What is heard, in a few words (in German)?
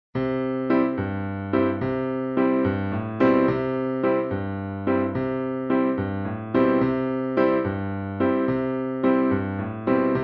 nur Begleitung